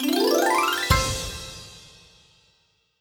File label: level up; Skillzgaming; fruit Blast 92 Desktop